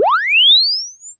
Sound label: Press Two Sides Chirp; Samsung 4 Recordings